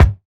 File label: dry bass drum sound g key, steel kick drum sample tuned to the G note